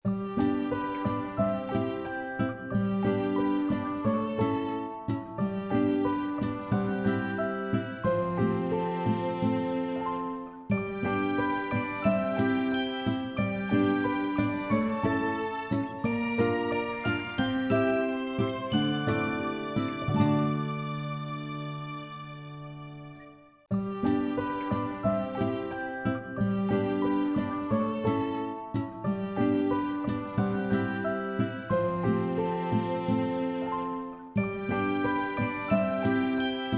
bgm Gameover, 3ds; etrian odyssey untold the millennium girl

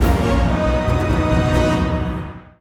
Music stings Skydive Trans

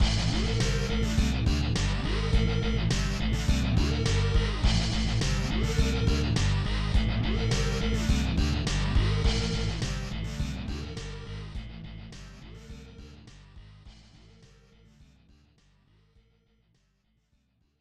Security Alert; X-Men Mutant Apocalypse

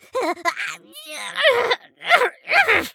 stagger

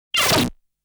shooting sound

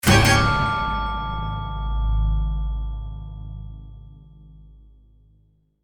Surprise stinger

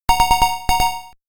Document Obtained; Elevator Action; arcade

